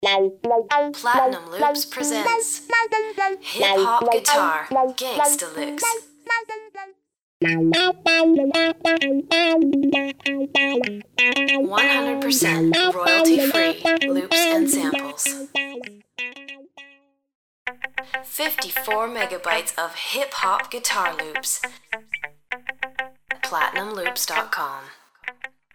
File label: gangsta guitars hot licks